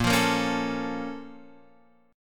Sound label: A sharp Minor Major 7th, down; A#mM7 strummed; JGuitar